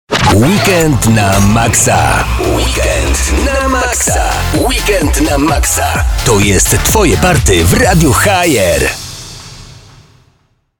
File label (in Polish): jingiel radio; RADIO HAJER - Jingiel